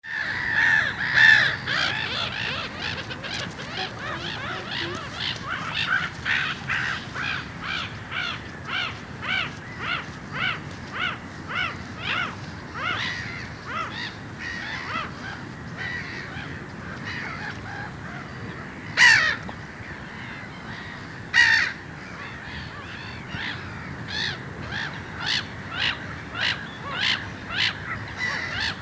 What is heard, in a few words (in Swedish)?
Skrattmas